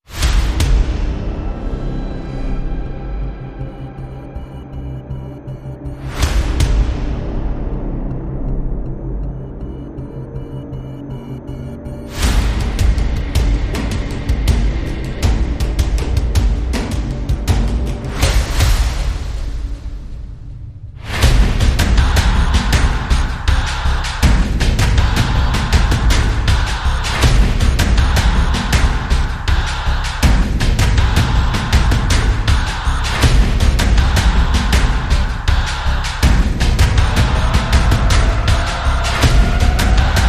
Instrumental No Vocals No Bass No Guitar

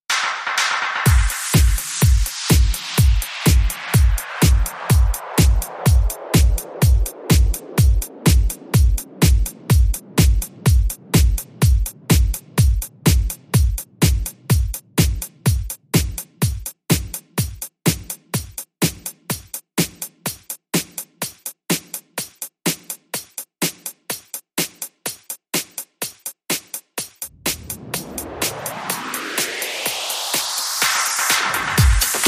Universe Beat

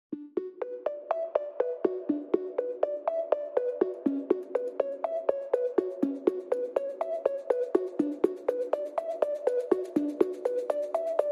Drums and Pulses Only

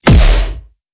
Hit Or Miss

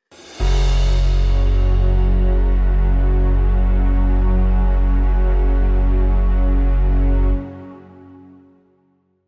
Short Sting

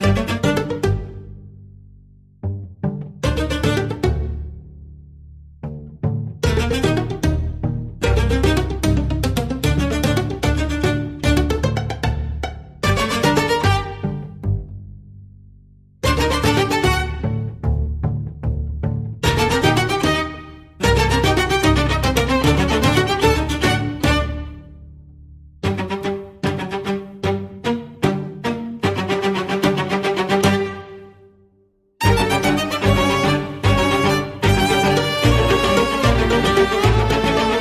Chamber

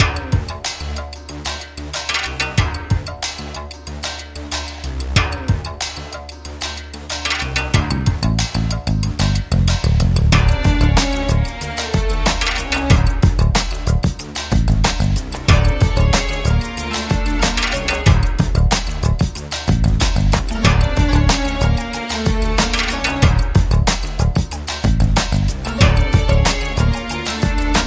Istanbul Action, No Clarinet

